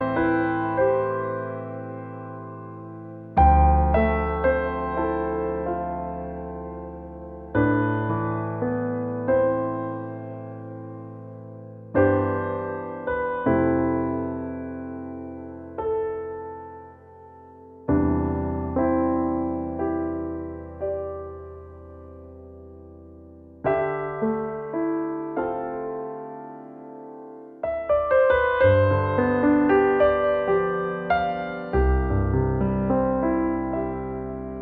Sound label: improvisation